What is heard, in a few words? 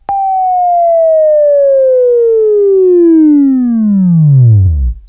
Buzz; Labview-Program; Sweeping Training program